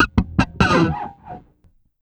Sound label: scrapes, bass; Sampleheads; Ultra Freakin' Bass; EFX